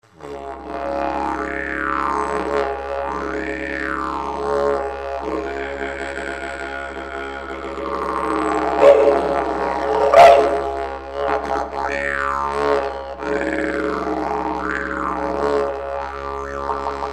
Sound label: digeridoo